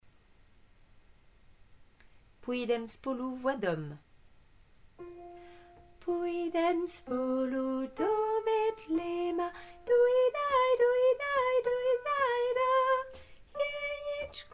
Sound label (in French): hommes